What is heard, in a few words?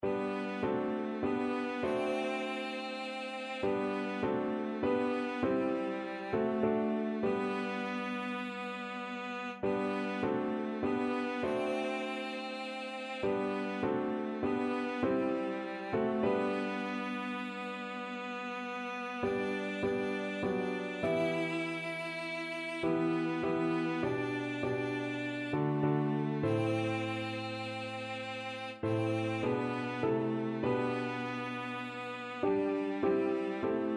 be still my soul, cello